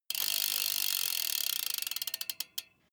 bmx bike freewheel push and slow down stop

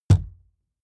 wood impact hollow large

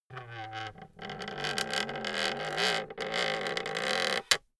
wood creak slow polyphonic

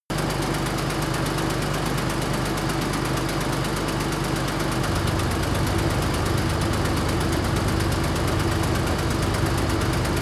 tank small engine slow idle steady

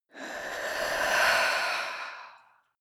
female breath slow out

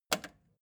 record player repeat jump out click fast

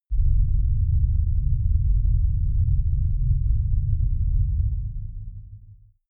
avalanche rumble

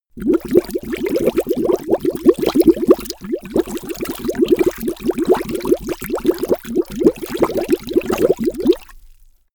bubble

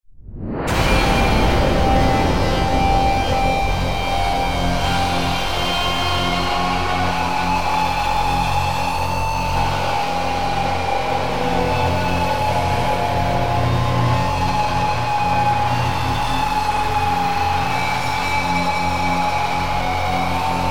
aggressive guitar pad

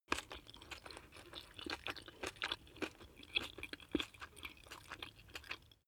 chew spaghetti medium

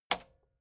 metal button spring click fast

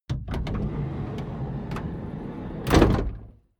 van slide door open

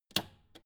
old button hard press

